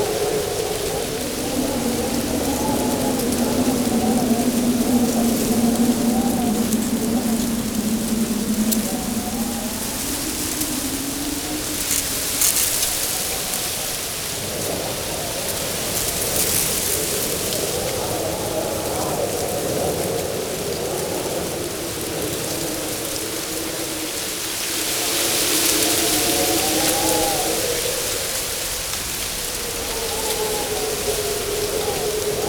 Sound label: Exterior Foreground Field Angry Jealous Loop; Warframe; Duviri